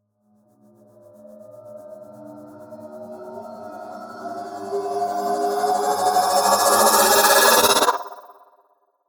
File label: Risers Metaphysical Engine; Gothic Storm Music; Advanced Sound Design